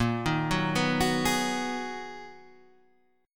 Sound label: Ab Minor 7th Bb chord; Abm7/Bb; arpeggiated; JGuitar